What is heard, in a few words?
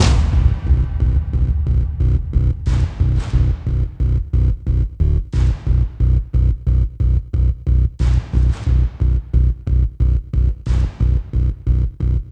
Industrial Combat; LAYER 1